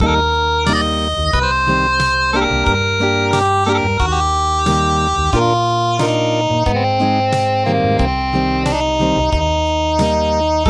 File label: Acoustic